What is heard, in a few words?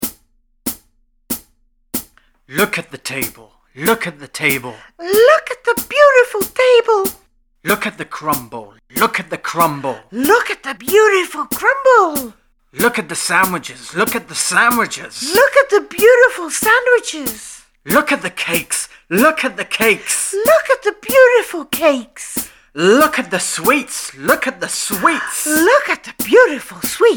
chant